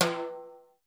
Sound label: LoTimb, Roland JV 1080; Drums